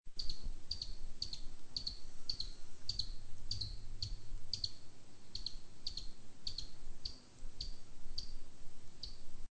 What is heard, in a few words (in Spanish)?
come sebo grande